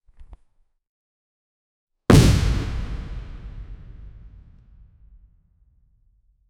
heavy swing, golf

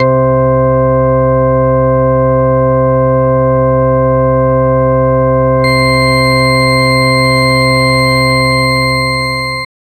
fairy kind sweet crunchy

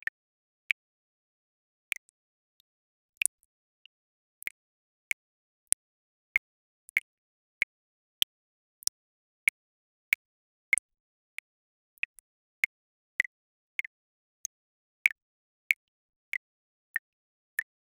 slowly dripping tap sound

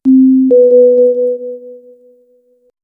light suspense music like

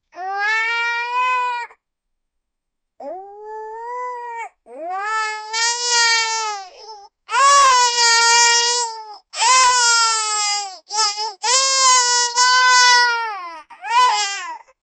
baby rhino calling a

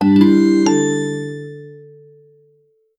a gentle rising tone, strings

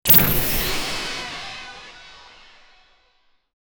a scifi electric energy s, deflection

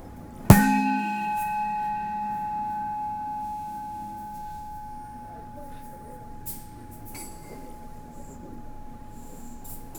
a wizard waving a magic, ceremony

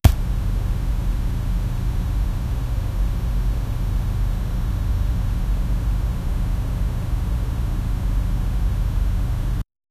soft sounds of an abandon, house

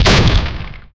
short strong robotic explosion

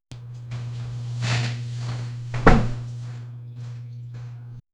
bending over sudden strai

